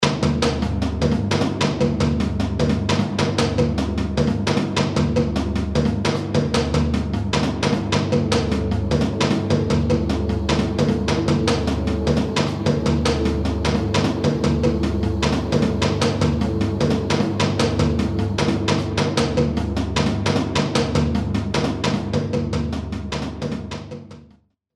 PERCUS

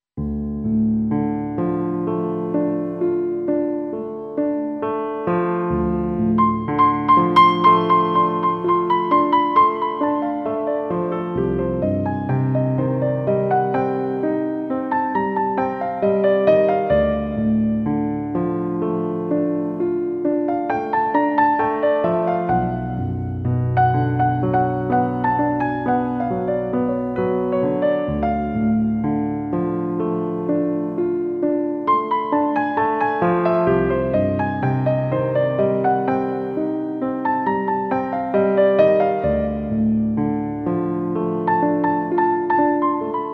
BALLADE EN RE MINEUR

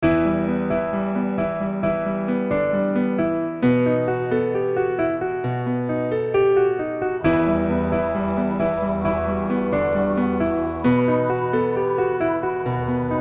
BACHOS EN MI MINEUR

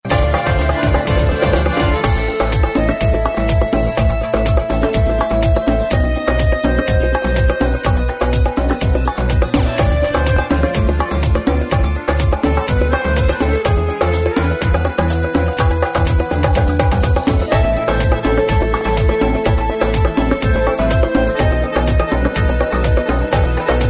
TOURBILLON DISCO

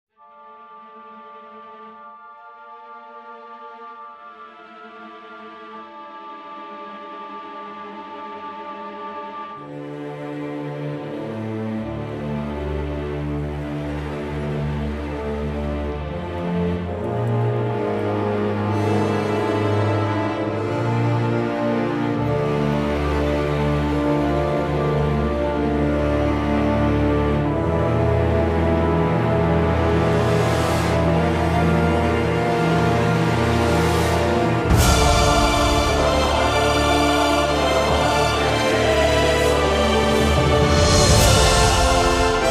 AMBIANCE CONFLIT

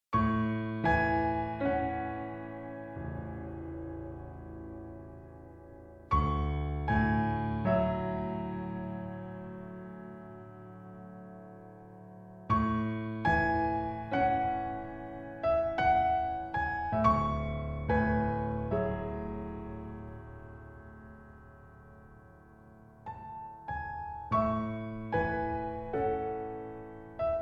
PIANO MELANCOLIQUE

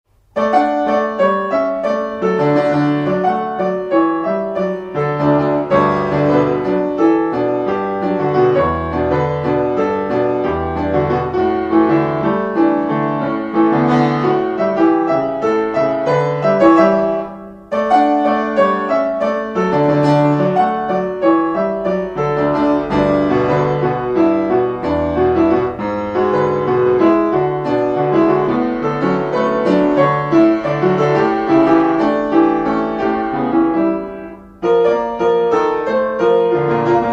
PRELUDE 5 EN RE MAJEUR

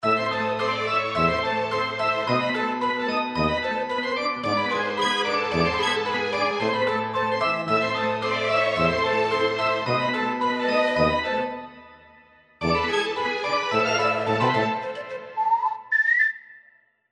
HALLOWEEN TRAIN FANTOME

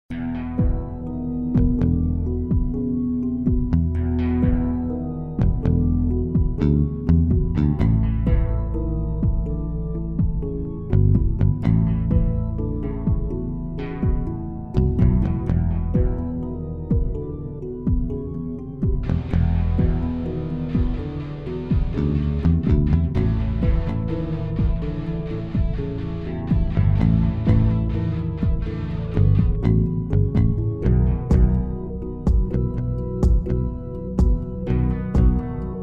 BLUES RAPIDE

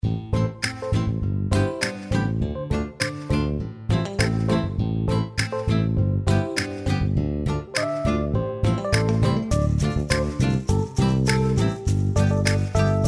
RAEGGE PEKINOIS

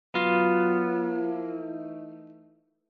VIRGULE STEEL DOWN

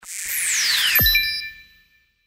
FX CARTES

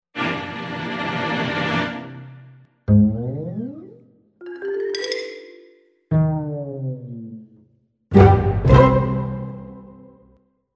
TENSION QUESTION FIN

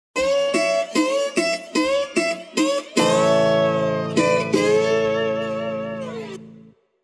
VIRGULE DOBRO LONG

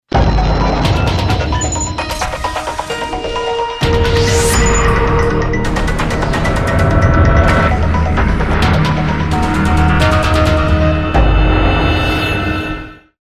TRANSITION INSOLITE

